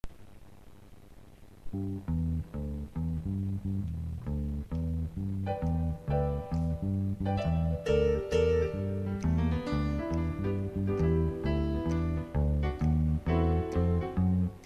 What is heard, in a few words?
gospel; swing low